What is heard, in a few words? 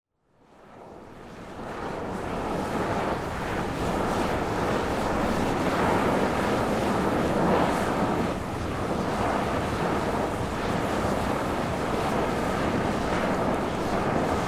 Norris Porcelain Basin Fumerole